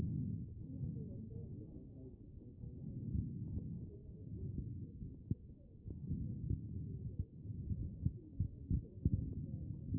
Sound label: CHEST; B MODE